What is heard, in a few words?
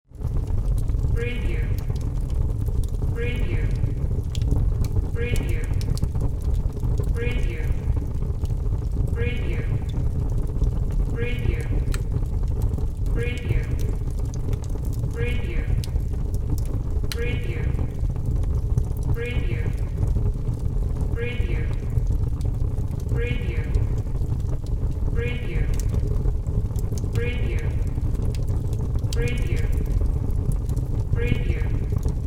fire